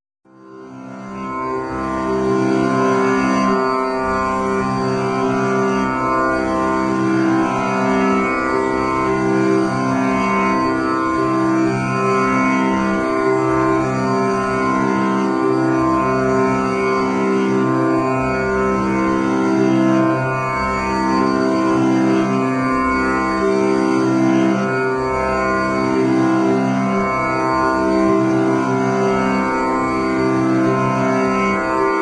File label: tamboura